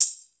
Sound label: RY HOUS TAM, house; Hollywood Edge; The Big Bad Beatmachine; RY-30